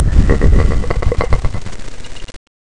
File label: Evil Laugh Low